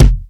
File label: DRY KICK 6; Greytsounds; Scott Peers Sound Engineering